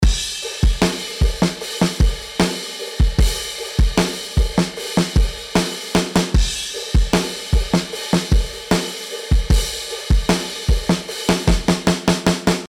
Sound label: drums after